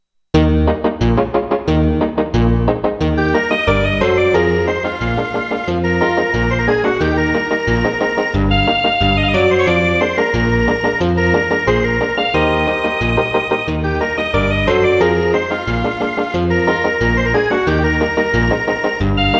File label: cha cha cha